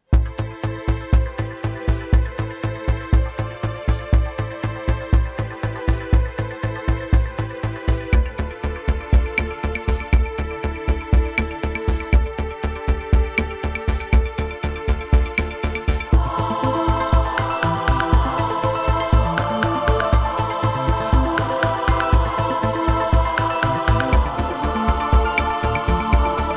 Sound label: Synth Dry; VSL MIRPro3D